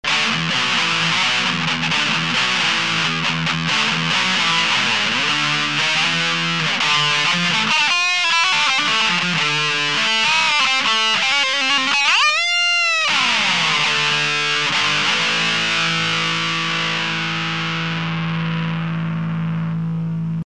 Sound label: turbodrive